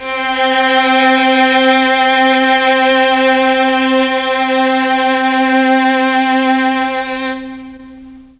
C2 VLNS, Mellotron; Mike Pinder - Mellotron Archive CD

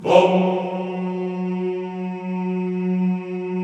CHO F#2, Vohmm Hohmm; Sound & Vision; Gigapack I CD 2

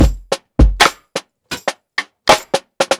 PICCOLO LAZY, breaks, drums; Basement drums vol 2

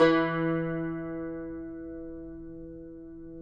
CUMBUS E, Guitars; Spectrasonics; Hans Zimmer Guitars VOL-2

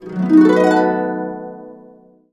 B, Complete Harps; USB Soundscan vol.62; AKAI

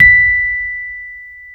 Electric Pianos; Wizoo

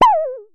Synare Filter; Star Instruments Synare 3

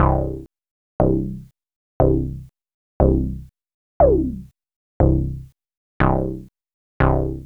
Moog bass; AMG - Vince Clarke Lucky Bastard

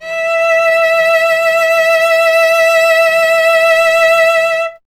STR cello E, Cello; Miroslav Vitous; Solo Instruments VOL-1

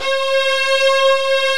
STRING ENSMB; Greytsounds VOL-1

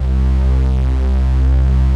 STR HIT; Pro-Rec - Synthsonic

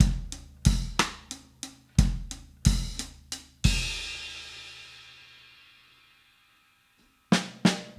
Drums Fill, 70s Analog Funk; Sonic Foundry (Sony Creative Software); What it Is!

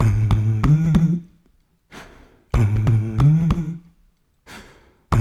HMM, 93; Spectrasonics - Supreme Beats - World Dance

